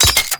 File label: Pistol Mech; Warframe; Scaldra Pistol